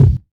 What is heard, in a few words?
low tone bass drum single shot g key; kick drum samples